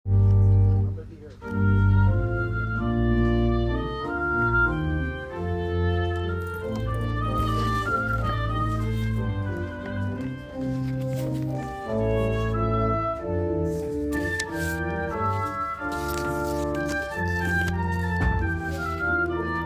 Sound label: special musical prelude